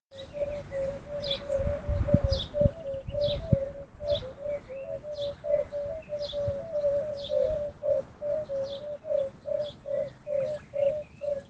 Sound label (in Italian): canto ululone; ululone dal ventre giallo; chi è l'ululone dal ventre giallo